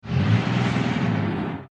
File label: Rev; cars; Dusty Rust-eze